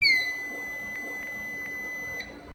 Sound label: fire panel reset; blizzard